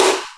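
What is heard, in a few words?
raspy snare, Boss DR660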